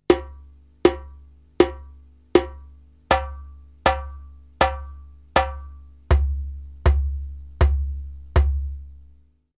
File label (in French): djembe 3 frappes, Afrique rythmes